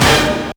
stab, Yamaha DD-8

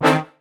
Misc Stab

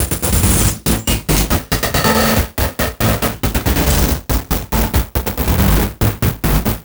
Envelope Beat; Drums & Drones

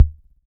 Kay BD, Kay DRM1 drum machine; 300 Drum Machines

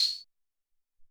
Noize

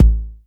Noisy bd, Jomox Airbase99

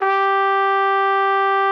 G4, TRUMPET; Xsample VOL-3

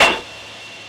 Metallic, One Shot Drums

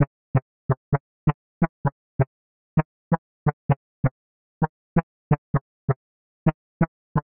beat FX; 125 Beats

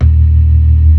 ORGAN, NO PERC

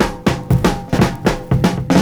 FILLS, 70_s Breakbeats

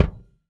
Focused, Kicks; Airwindows

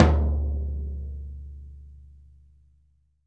P. F TOM MP; VELOCITY TOM 2

